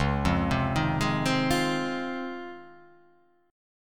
C sharp Major 9th

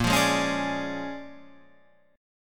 A sharp 7th Sharp 9th, guitar chord; down

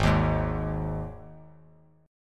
G sharp 5th; strummed; JGuitar

